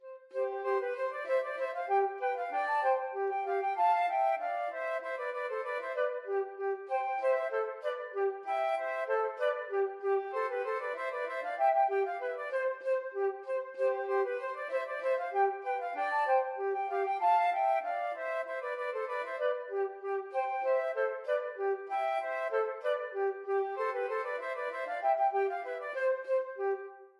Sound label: zwiefacher, flute duet